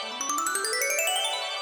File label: re spin count up loop; netent